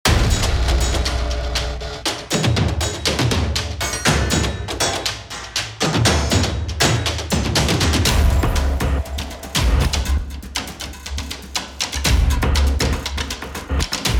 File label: Rythm; Excubitor